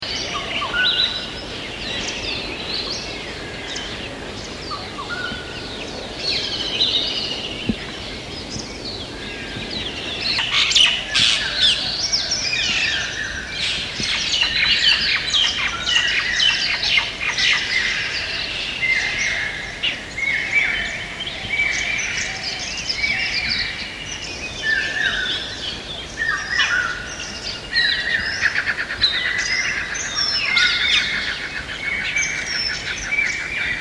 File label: Birds life on the Terai, Nepal